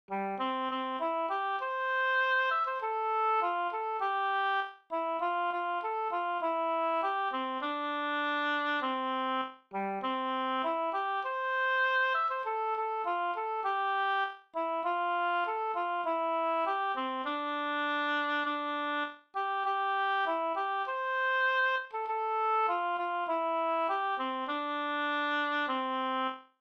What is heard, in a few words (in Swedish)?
vismelodi; Engsovisan